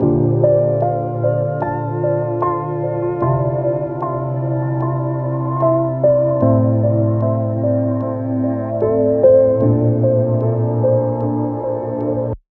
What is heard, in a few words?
lo-fi piano